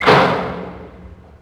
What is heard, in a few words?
door metal close; left4dead; re doors